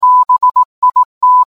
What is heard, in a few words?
transaction, sound